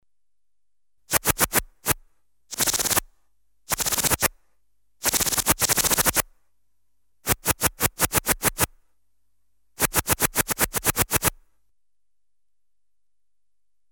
Inverse gun (bursts), Electronically Generated Sounds; Sound Effects Library